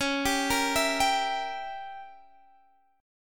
C sharp Diminished 7th C sharp down Guitar Standard; arpeggiated; capo 9